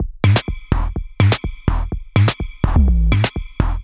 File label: 125bpm, House Drumloops